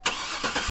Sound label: engine start; DeadlyRace